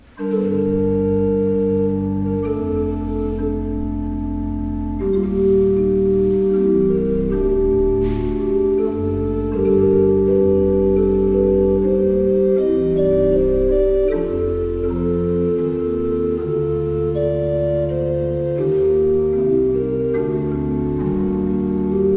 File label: Fleiter, pipe organ